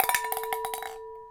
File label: Ping Pong Rattle, Large Wine Glass